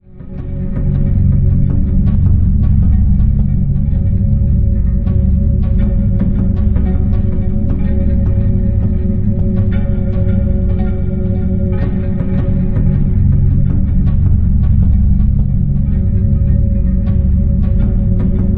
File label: romantic